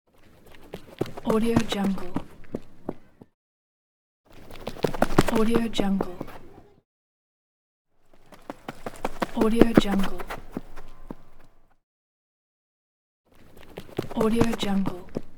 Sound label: run